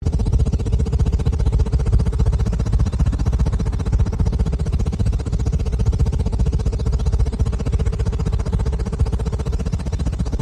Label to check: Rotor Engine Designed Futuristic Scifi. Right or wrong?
Right